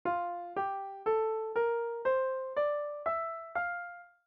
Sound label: F major scale